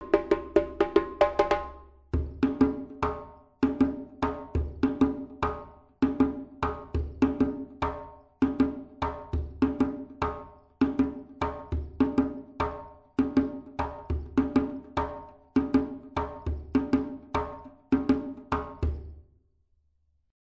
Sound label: Balakulandjan, djembe